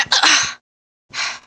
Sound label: Grunt; Lewd SFX